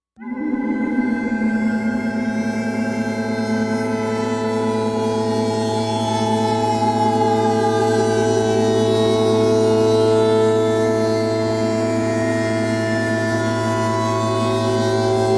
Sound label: Hold Line Static Vibrat, energy beams